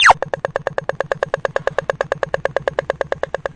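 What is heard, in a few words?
electronics gone wrong 16ths, 135 bpm; SYNTH AND ELECTRONIC LOOPS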